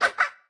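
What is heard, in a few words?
Chicken baby attack, 初级形态攻击音效; 母鸡会用自己的喙不断地啄对手; 绿野世界; 卡通农场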